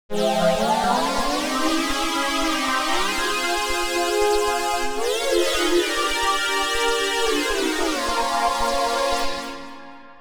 hassium pad; the space soundset

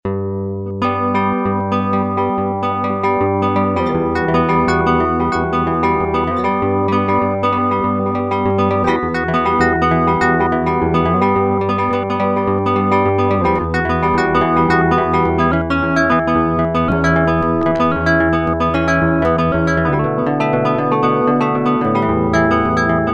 guit middle

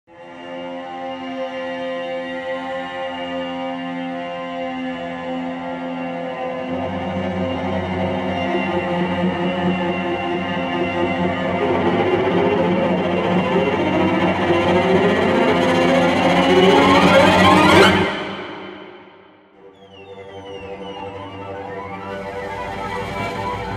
cello extended techniques; winters dark; cello extended techniques sample library; elastic sounds

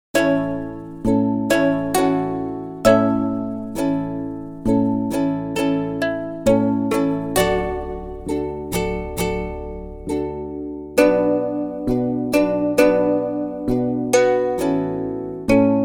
aloha ukule; aloha ukulele; tiki instruments; chiqui audio

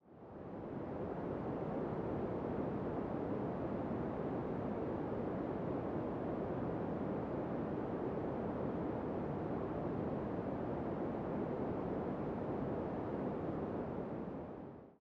train interior; noisepalette; sirenfx

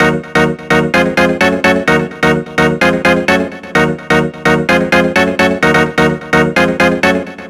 organ parish; the producer school

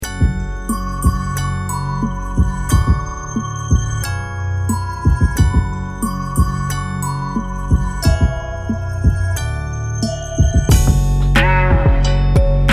latenight hip hop construction kits; big fish audio

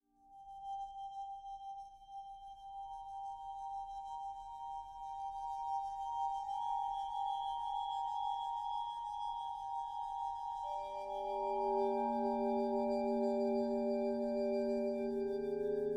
vibes bowed fragile light, vibraphone; virtuosity vibraphone; versilian studios

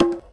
net bongo swipe perc; hoops drums; drum kit; nova sound

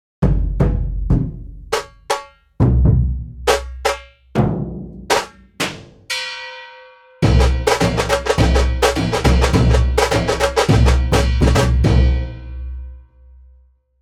dhol tasha playable kit; north india; sonic atlas; pitch innovations

